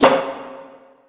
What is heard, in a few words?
serve; VirtuaTennis